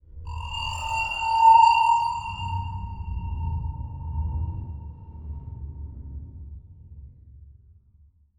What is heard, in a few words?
Title Transition Crystal Eerie; Production Element Title Transition